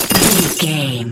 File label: ice spell impact icicle hits